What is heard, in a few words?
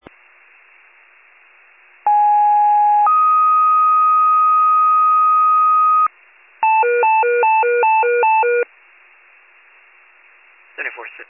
FIRE pager alert